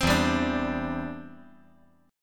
E Minor 7th Sharp 5th, down

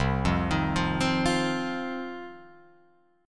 C Minor Double Flat 5th, Guitar, Open F; Cmbb5 arpeggiated; JGuitar

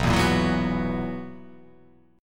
B Major 7th Suspended 4th B down

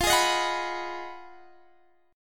B 6th F, guitar chord; B6/F; strummed

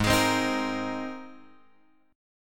G sharp Major Add 9th, chord; G#add9 Chord {4 X 6 5 4 6} strummed

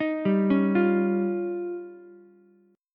F 7th Suspended 2nd Sharp 5th, Ukulele; F7sus2#5; arpeggiated; JGuitar

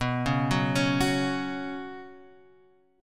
B Suspended 2nd, Guitar, Half Step Down

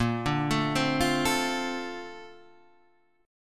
Bb Major 9th, Bb

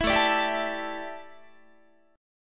A 7th Suspended 4th, A, down, Guitar

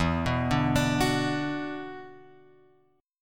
E 7th Suspended 2nd, chord; E7sus2 Chord {0 2 0 X 3 2} arpeggiated

